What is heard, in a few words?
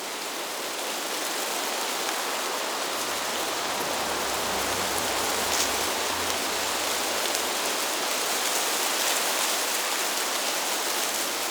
Exterior Foreground Forest Loop, ambience; Duviri